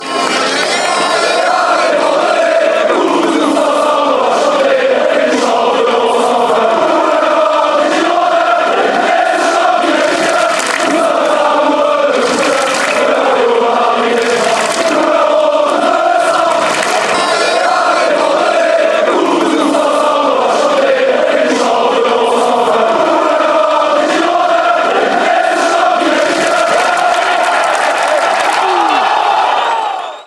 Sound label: hyme du virage sud, fanchants